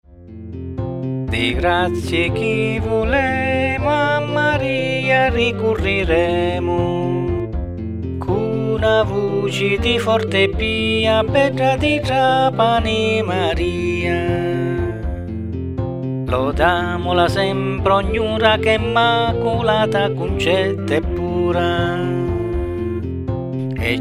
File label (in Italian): festa assunta voce strumenti